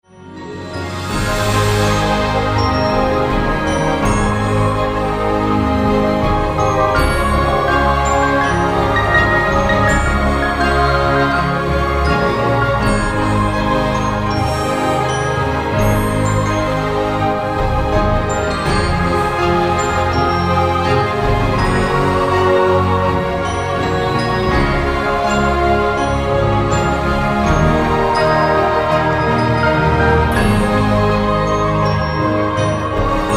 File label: Angelic Melody